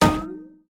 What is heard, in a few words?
Wood Damage; Bad Piggies